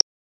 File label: hover, cursor